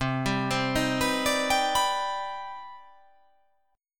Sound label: C Minor Major 9th C